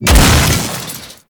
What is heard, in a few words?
orb explode; Mod Quest; Warframe; The Teacher